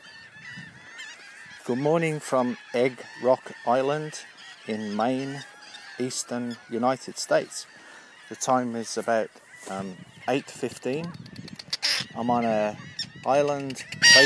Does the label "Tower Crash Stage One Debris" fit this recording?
No